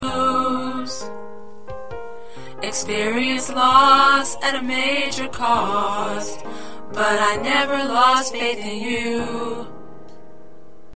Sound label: Tenor, choir parts